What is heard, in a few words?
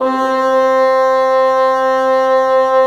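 WND BN, wind bassoon; LA Composer vol. 3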